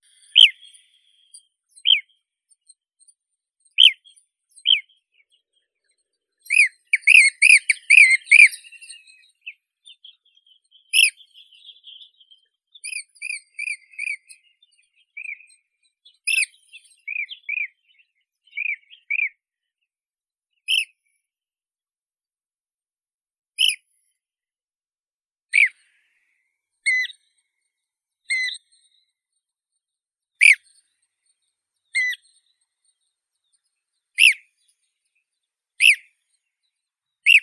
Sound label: shurka zelenaya merops persicus; zvuki prirodi